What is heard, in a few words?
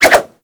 Dagger Spin Whoosh; Warframe